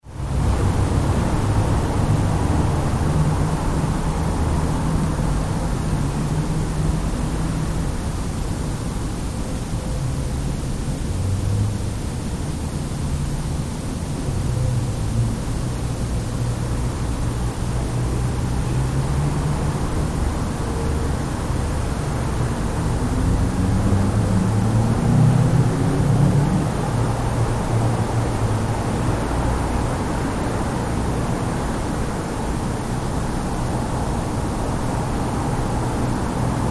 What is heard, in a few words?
Church Interior Atmosphere, Empty church; with very background traffic; Church Sound Effects; Churches